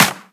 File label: hand clap g key; snare drum alternatives